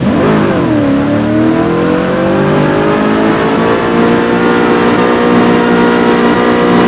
vehicle reverse

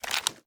reload empty fast xmag start; gmod

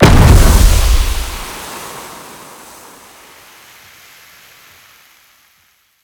mp gas grenade trans body; gmod; viper

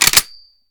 reload empty charge; gmod; viper; weapons

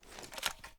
ar reload dmr rotate; weapons; gmod; viper; scharlie

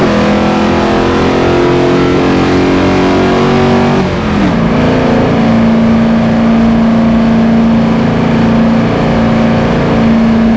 fourth cruise, vehicles; gmod; tdmcars; ml63

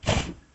gas buff; gmod; zerolib

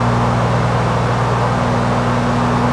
fourth cruise, vehicles; primo

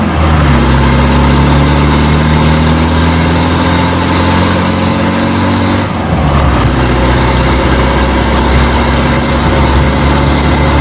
third, vehicles

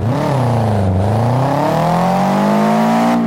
first, vehicles

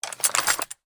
wpn gbl plr inspect settle; viper

